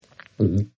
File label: Gulp, Eating; Partners In Rhyme